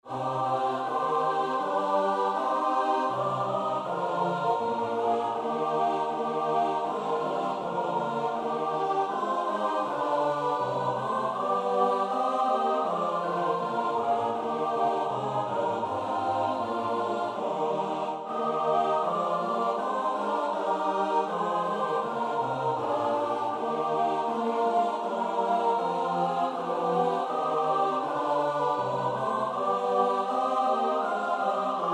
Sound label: S A T B; Bach (Johann Sebastiaan); 6 Ist Gott mein Schutz und treuer Hirt